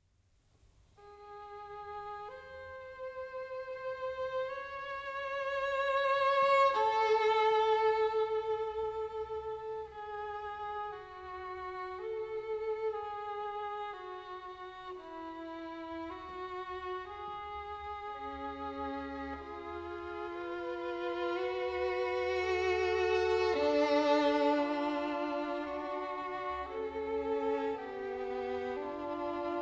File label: String Quartet in C sharp minor, Adagio ma non troppo; String Quartet No.14; Op.131; Ludwig van Beethoven